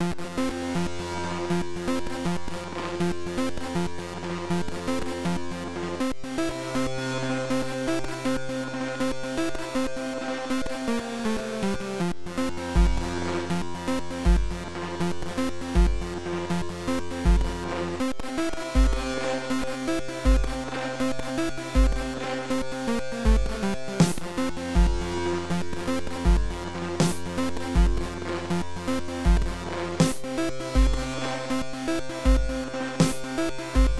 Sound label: Battle Music; Super-Robo-Squad